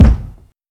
puffy bass drum b key, Royality free kick single hit tuned to the B note. Loudest frequency: 171Hz